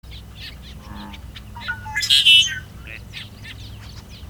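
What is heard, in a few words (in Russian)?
свист и странные звуки черного дрозда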